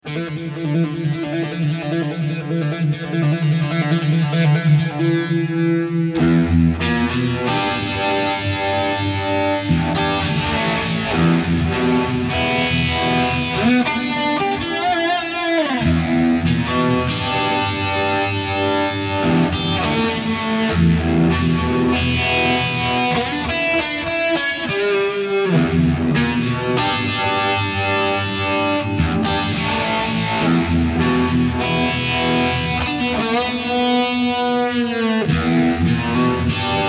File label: tone test; 80s TD